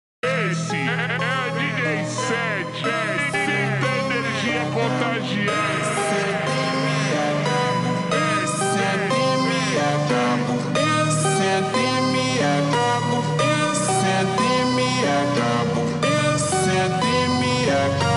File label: funk super slowed reverb